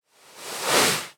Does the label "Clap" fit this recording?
No